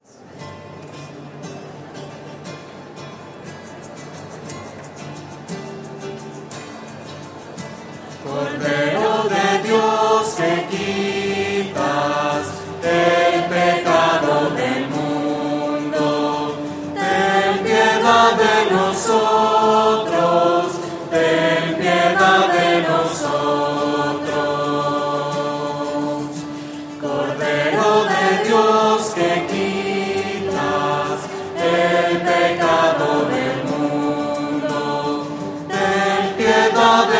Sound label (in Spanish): cordero de dios voces; misas 2012